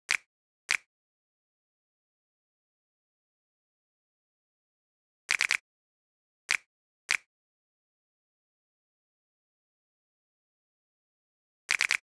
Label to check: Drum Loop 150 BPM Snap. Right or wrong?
Right